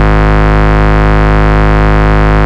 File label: Root G#, Bass; Vengeance Samples